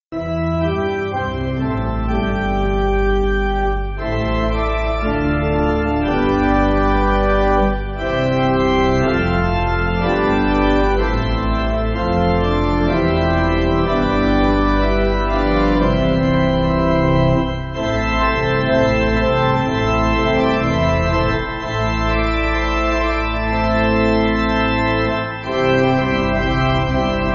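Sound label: Pipe Organ, Free Music Backing Files for Churches; Joyful Tidings Bring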